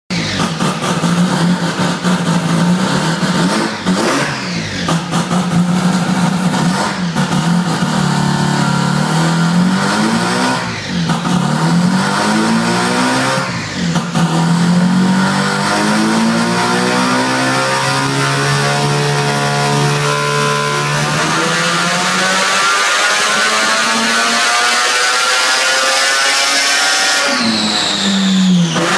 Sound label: loud rotary; gemini